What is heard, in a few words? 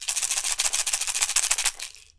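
COW RATTLE, PERC; AKAI S6000 CD-ROM - WorldSounds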